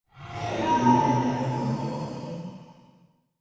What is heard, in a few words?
Death